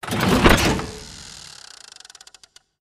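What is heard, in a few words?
Mechanism Crank Lever Pull; the unfinished swan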